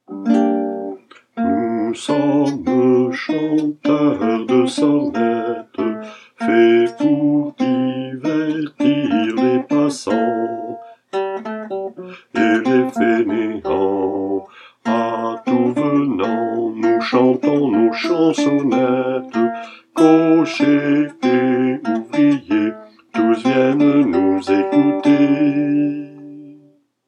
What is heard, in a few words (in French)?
nous sommes chanteurs alt, chorale